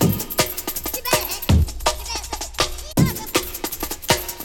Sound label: Kid Break, Breakbeats; Polyend; Stazma & Concrete Collage; Kid Break ALL